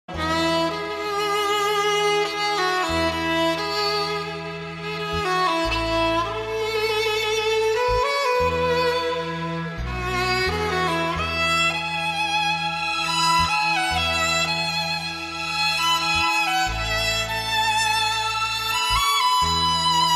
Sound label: soulful